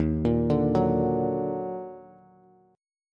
D sharp Minor Major 7th D sharp down Bass; arpeggiated; chord

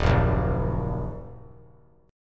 Eb Minor Eb 3C 3F 3A 3D; Ebm strummed; JGuitar

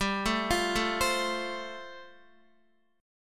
G 7th Suspended 2nd Suspended 4th, Guitar

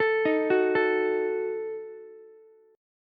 Eb Diminished, Ukulele; Ebdim arpeggiated; 14,15,14,12; JGuitar

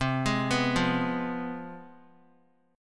C Major 7th Suspended 4th Sharp 5th, down; CM7sus4#5 arpeggiated; JGuitar

